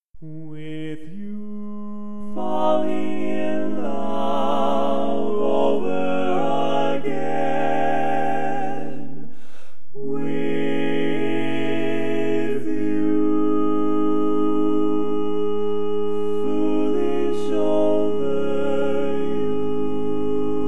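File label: baritone, barbershop tag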